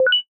Tri Tone Up Beep

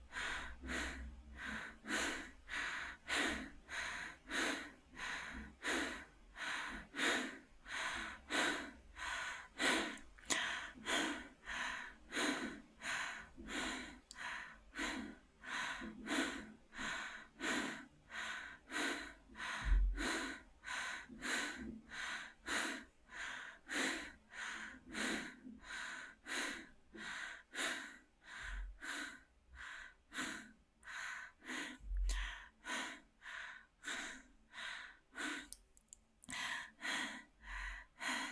Out Of Breath Woman Fast

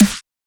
Phaser snare

